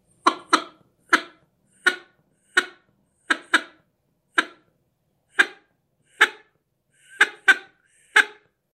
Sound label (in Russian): широконоска